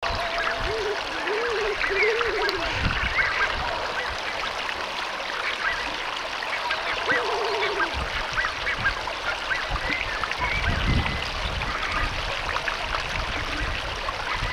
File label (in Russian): gaga grebenushka golos